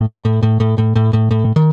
Bass

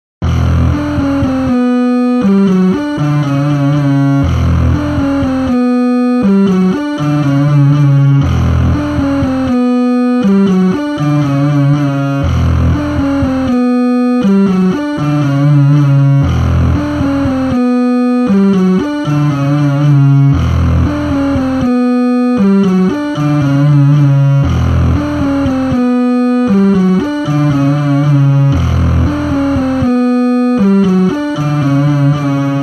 Bass; Mr. Tabs

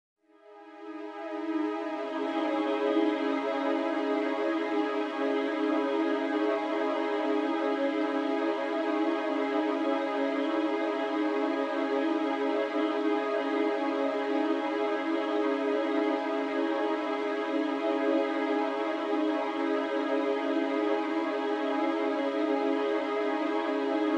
Synth; Mr. Tabs

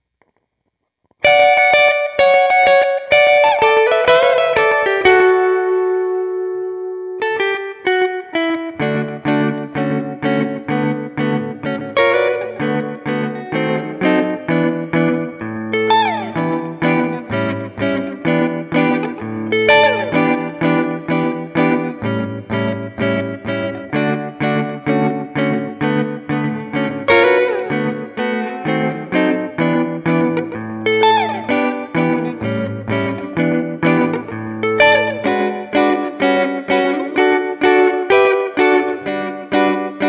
Rhythm Guitar

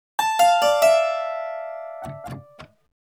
Harpsichord

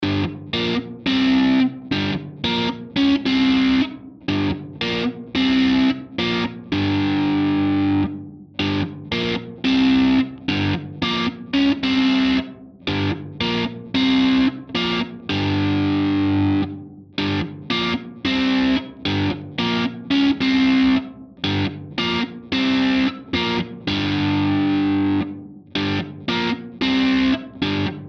Intro Guitar

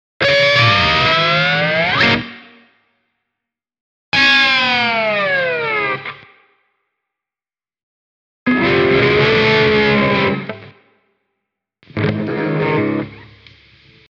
Intro Slides

